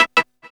PULSE E; AMG; Global Trance Mission VOL-1

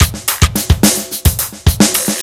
beat 108

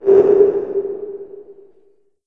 LH HC808 -R, REVRB TR 9+8 LARGEHALL808

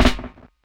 SNARE FLAM SOFT, DRUM N BASS KIT; DRUM N BASS:JUNGLE BEATS; DRUM N BASS KIT 2

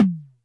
Toms, Elektron MachineDrum SPS1 MKII

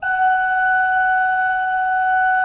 F4, Cathedral Organ; ILIO; Propeller Islands Cathedral Organ

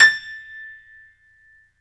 PNO XYPNO, Grand Pno, Roland; Club-50 - Foundations; Grand Pno 1

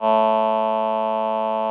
VP-330, String Machines

